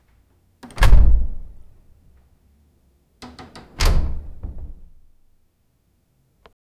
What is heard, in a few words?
the sound of a thin